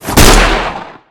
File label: gun fire; Effect